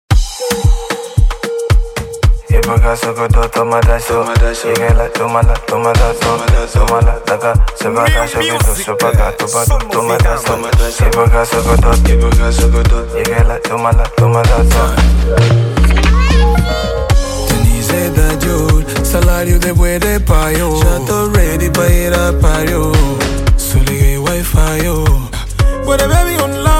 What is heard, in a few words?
Amapiano